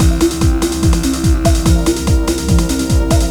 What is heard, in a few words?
drum loop